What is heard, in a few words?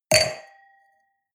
wine glass place table hard, gastronomie; soundpool